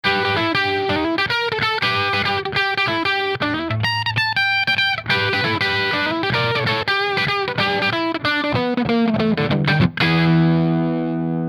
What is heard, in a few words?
Gibson SG Bridge distorted